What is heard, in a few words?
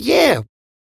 Beatbox; neuro; Sinistarr